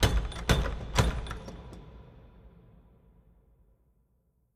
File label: knock